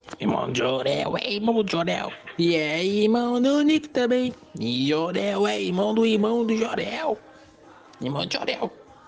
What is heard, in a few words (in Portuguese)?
musica; jorel